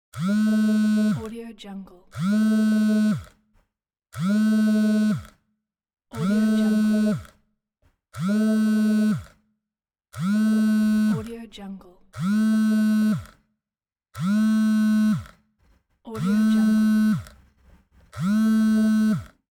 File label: vibration phone